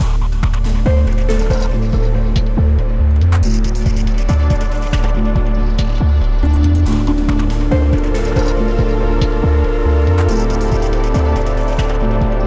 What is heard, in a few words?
Ambient Working; NWNDS; Ambient music pack